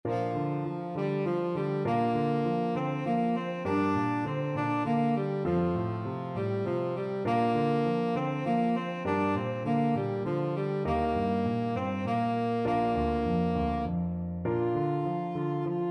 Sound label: tenor saxophone